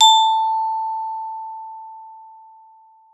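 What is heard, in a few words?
GLOF A3, glockenspiel; Xsample VOL-4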